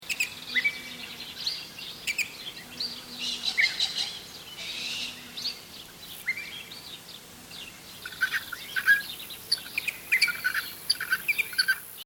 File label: Birds mono